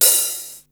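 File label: hi-hat hip open; 300 Drum Machines; Yamaha Motif ES-Series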